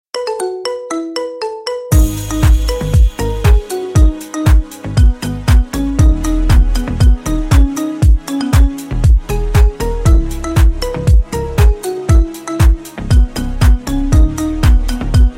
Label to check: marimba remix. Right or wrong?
Right